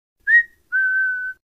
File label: Whistling, notifications; Tecno HiOS 16